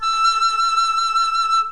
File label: E6 split E with donut, flute; modern